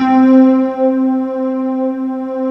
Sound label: JAZZY C3